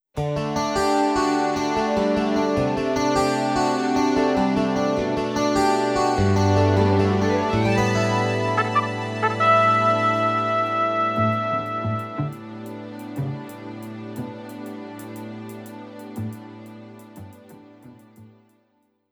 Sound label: Airplane Intro